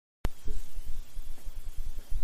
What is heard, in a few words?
sliding door; Foley